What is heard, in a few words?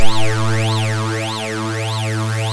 2600 A1, ARP 2600; Hollywood Edge - Giorgio Moroder Rare Synthesizer Collection